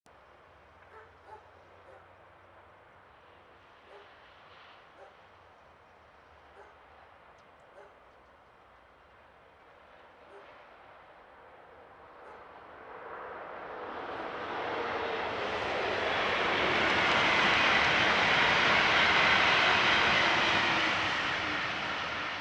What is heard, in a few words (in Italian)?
Treno mono; Eigenmike; A-weighted; Nuova Strada